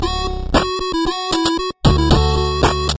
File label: 8bit